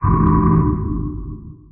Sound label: Warning